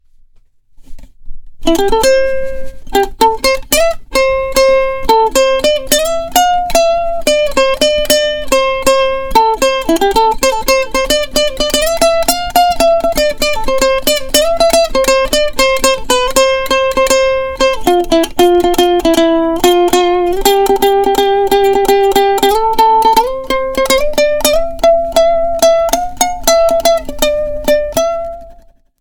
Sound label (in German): Solostimme